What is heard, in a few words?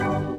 ps2 confirm; jojo golden wind